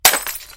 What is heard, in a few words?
break